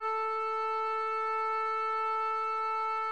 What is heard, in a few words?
instrument note